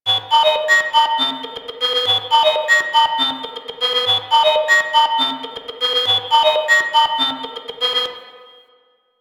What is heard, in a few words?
Arcade Misc